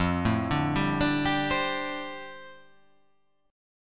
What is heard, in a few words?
F 6th Add 9th F down, chord